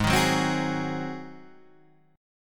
G sharp 7th; strummed; chord; JGuitar

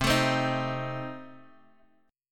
C Minor 6th Guitar Open G, chord

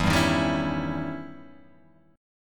E Minor Major 13th E Guitar Open G

